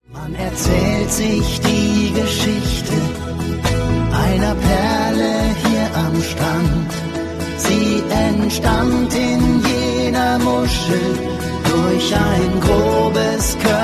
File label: Song